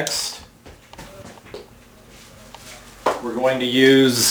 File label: run you hear like little kids foot steps